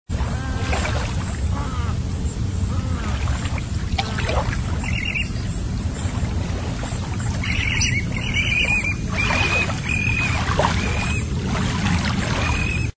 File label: Bayside